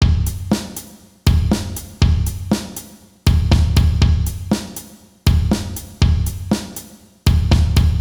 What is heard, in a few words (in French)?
contre temps exo 120 bpm; Un rythme à contre temps